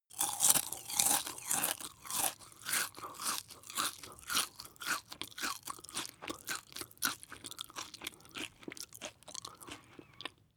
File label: chew biscuit smack